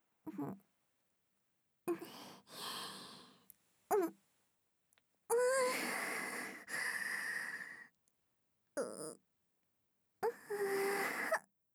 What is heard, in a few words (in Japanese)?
雨上がり; レイニングスリープ.ヒプノシナスタジア 共感覚催眠音声; 効果音あり